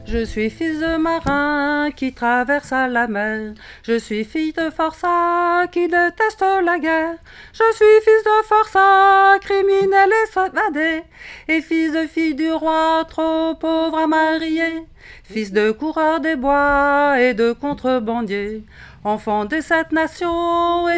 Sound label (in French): SOPRANE, Chansons